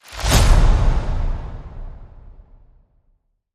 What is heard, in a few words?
Flyby Hit